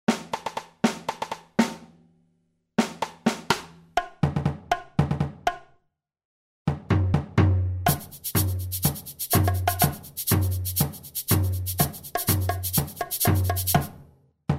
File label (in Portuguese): batucada tamborim